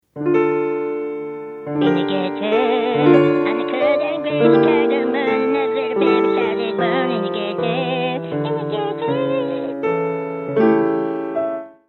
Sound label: sarabande